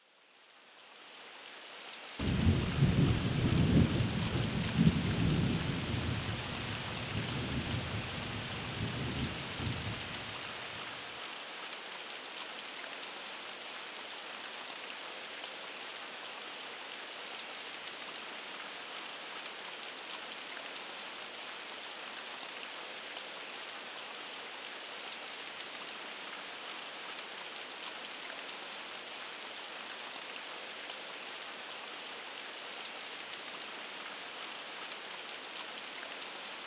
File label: thunder